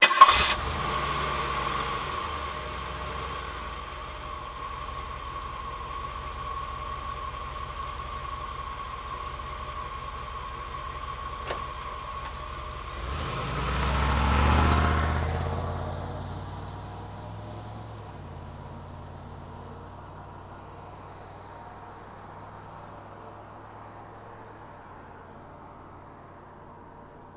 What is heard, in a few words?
Cadillac 1990 Exterior Start, Idle, Pull Away Fast Speed